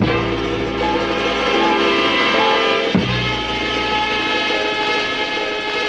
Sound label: horns transitional material, loops, phonky